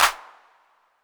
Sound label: Clap 808 Saturated A, Hand Clap Multi Saturated